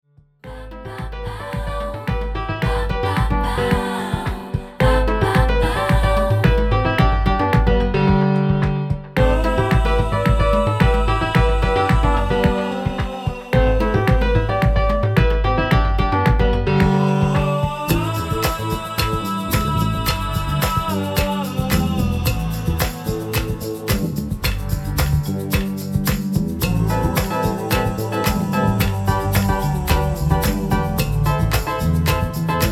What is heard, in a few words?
Samba feeling